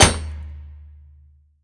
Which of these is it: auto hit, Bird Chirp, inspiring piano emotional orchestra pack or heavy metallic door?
auto hit